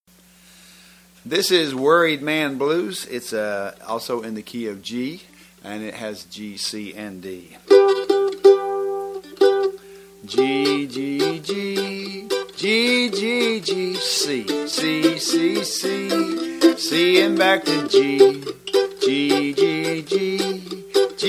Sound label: Worried Man Blues (G)